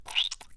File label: squish